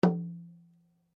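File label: Drum Flat Skin piano, Drum heads isolated from drum shell; Musical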